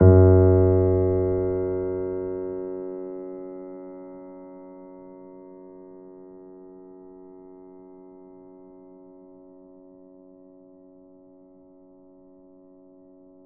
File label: Grand Piano F#1 p